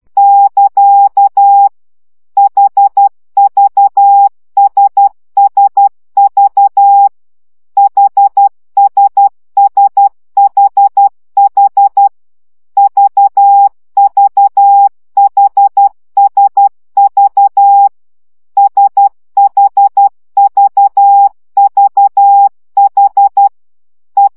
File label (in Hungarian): CW gyakorlas s h v 10 baud